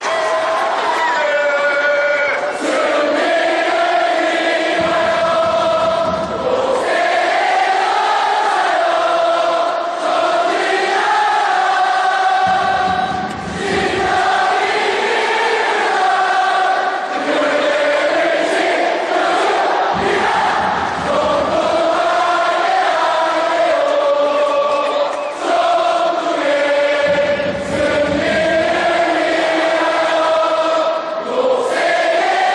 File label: heartbeat, chants